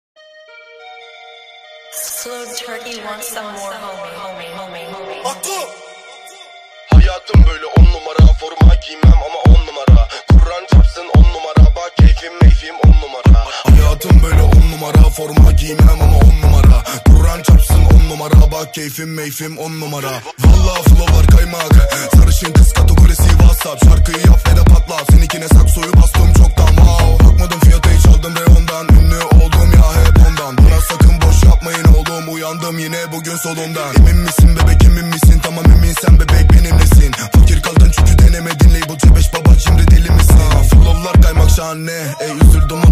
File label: Bass Boosted